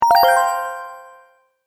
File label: call mail; HTC; Infobar-A02